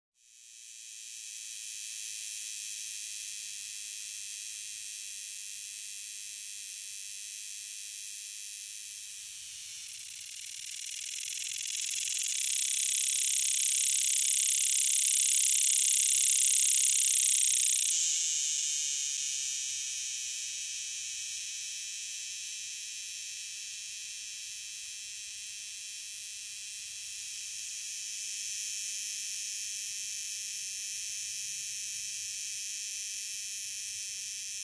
Cicada Ratchet Swells, Single Close Perspective. Steady Buzz Which Rises And Lowers In Intensity.